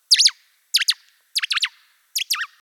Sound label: distress 10x